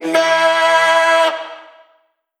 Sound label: Vocal one shot E; Slate Digital Omnivox Vocal Sample Pack